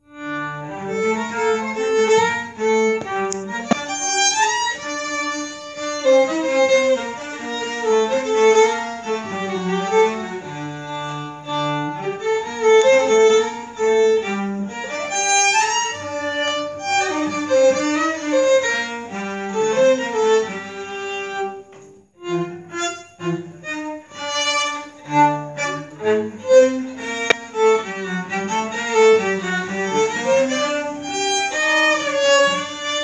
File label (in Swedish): Skogsråpolskan